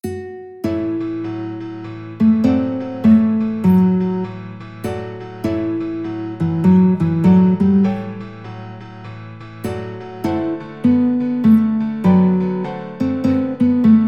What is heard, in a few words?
blues, guitar; cc rider